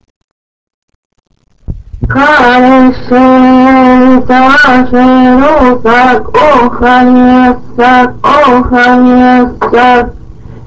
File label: lullaby, Indians